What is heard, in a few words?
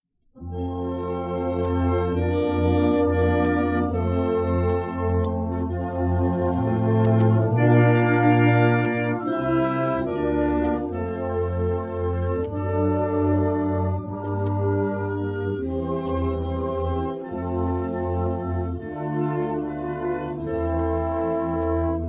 Russian Imperial Anthem; National Anthems & Patriotic Music